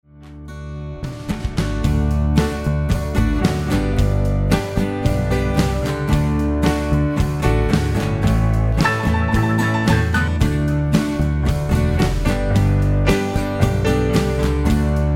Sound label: backing track